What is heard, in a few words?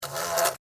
Wasp Trapped, Inside, Container, Shake, Wings, Buzz, Insect; Animal; Bug; Hornet